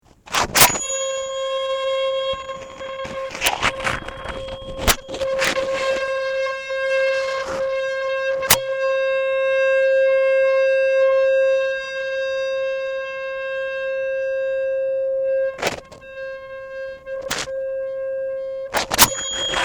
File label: sloppy tuning forks